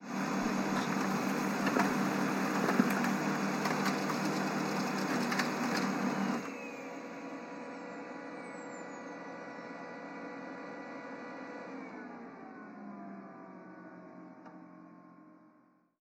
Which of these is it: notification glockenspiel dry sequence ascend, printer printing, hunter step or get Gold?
printer printing